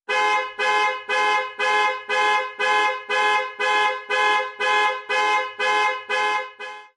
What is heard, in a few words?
Alarm Of The Car Ringtone